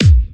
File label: good kick drum single shot b key